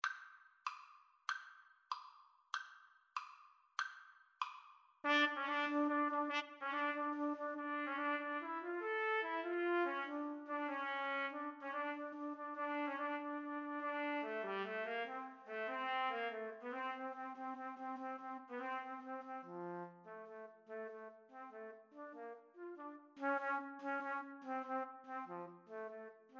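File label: Serenade trumpets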